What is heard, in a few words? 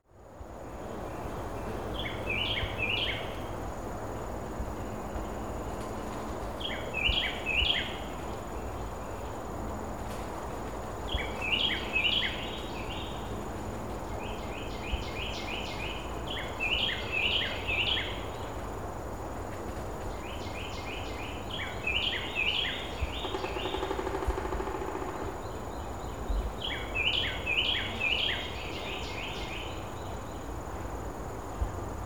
Carolina Wren; Fakahatchee Strand